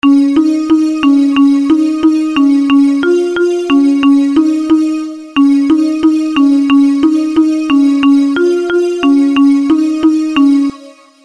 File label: Marimba